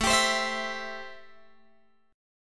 A-Minor 7th A Guitar Open G

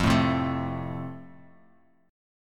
F Major, Bass Standard; strummed

